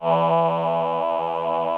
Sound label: VP CHOIR, VOCODER; Greytsounds VOL-1